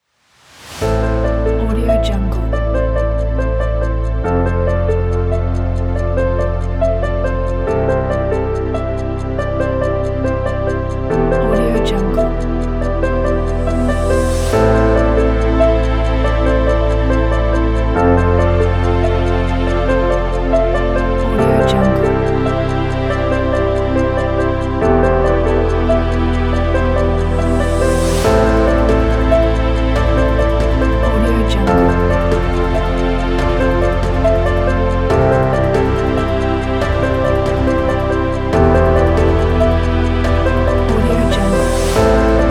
documentary

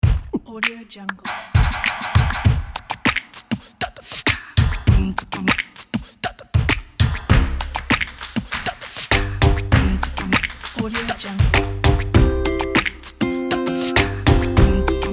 easy happy life stomp

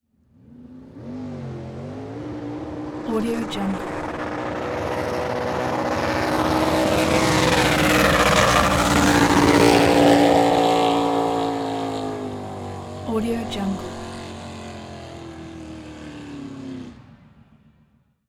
swamp buggy pass by; prosoundfx

